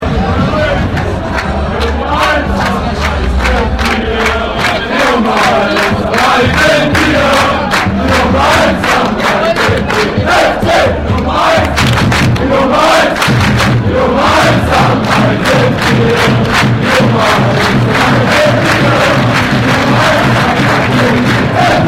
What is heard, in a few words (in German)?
Gesang live; Koln